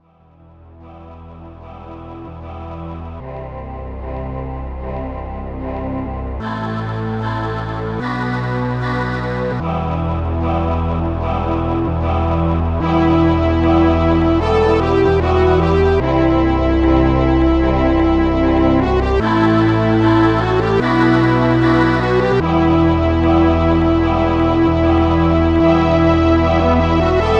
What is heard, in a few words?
Failure; Reunion